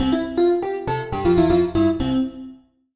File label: melodic stinger simple whimsy loss; mobile game; esmteam